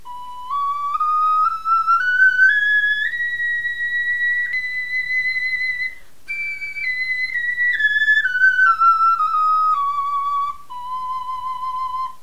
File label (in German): tonleiter, knochenfloeten